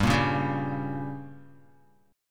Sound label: G Diminished, Guitar; strummed; JGuitar